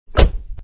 car door

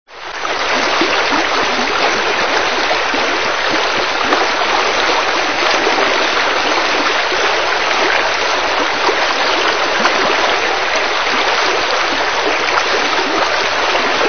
brook

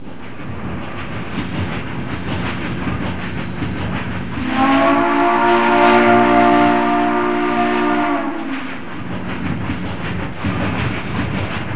nautical

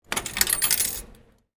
Payphone Coin Return

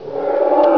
torosaurus

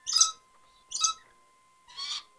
animals

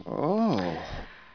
people

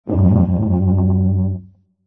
TUBA COMEDY

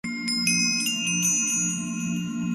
mistery

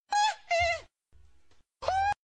hehe

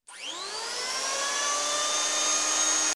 tools start

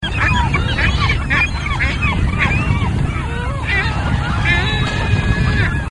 ring billed gull

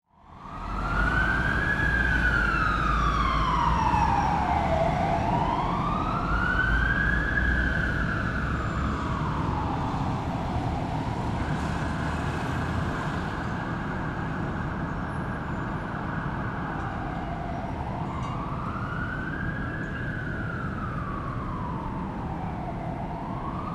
Police Car In The Distance

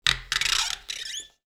Rubber Squeak

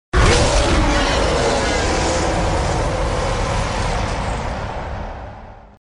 roar; hulk

